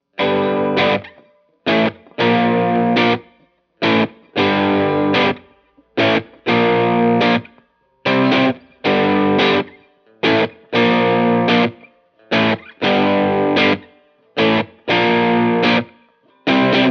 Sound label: bridge dirty, custom pickups